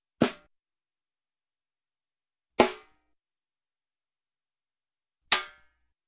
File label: Snare Drum snare Off, single Hit, Music; Sound Ideas; Series 2000